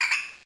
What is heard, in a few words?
Taunt; pokemon reborn e19 2022